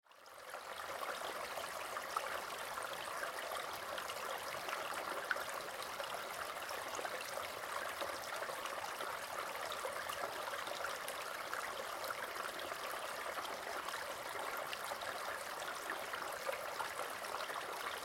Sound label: Soundscape; mYcard